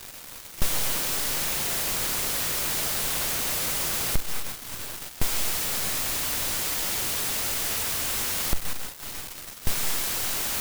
Tascam Model 2400 preamps INT REC ohne angeschl MIC

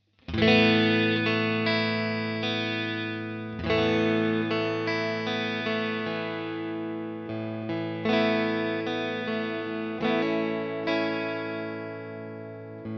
Tone Potis

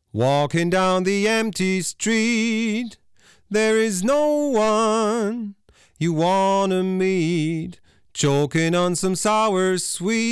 Vocal Kompression Hot Saturation

